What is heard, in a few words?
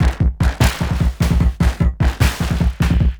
Beat, Industrial